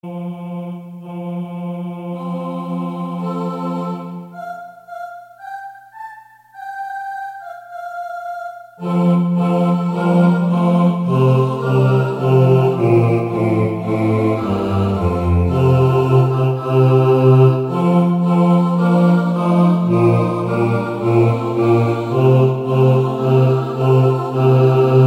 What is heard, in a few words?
BASS